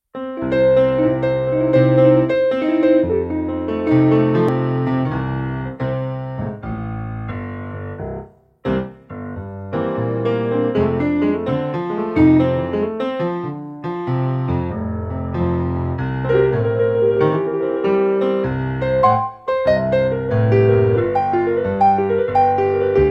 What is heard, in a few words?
pc3 rock